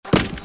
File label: wood breaks; Unravel Two